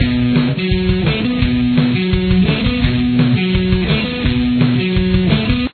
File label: guitar